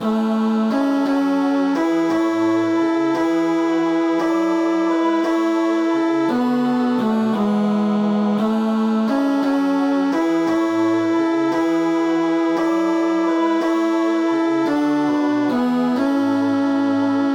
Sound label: Alleluia celtico